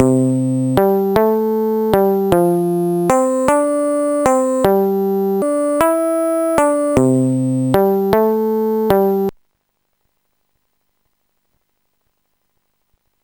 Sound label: bass midi; Sonix Music Driver